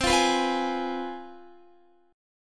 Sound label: C Major 7th Suspended 4th Sharp 5th C down Guitar; CM7sus4#5; strummed; 4E,4A,5D,5G,5C,6F